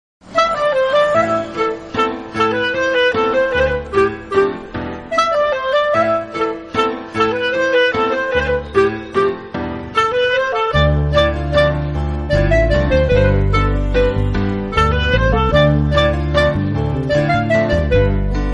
funny love background music tone

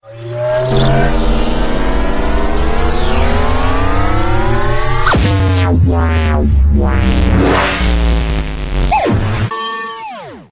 s m s ringtone; nokia

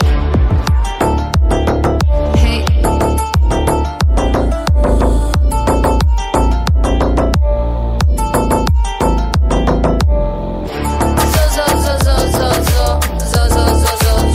remix music english ringtone

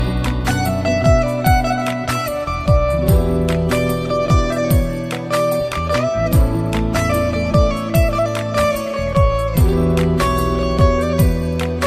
electronic instrumental ringtone, bollywood

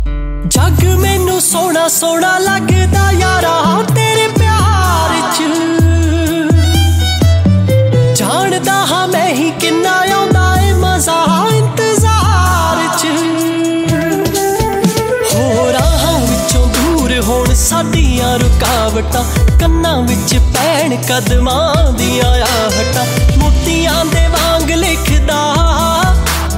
punjabi ringtone

